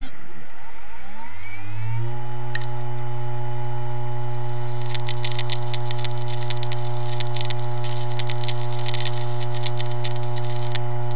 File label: seagate dying heads spin down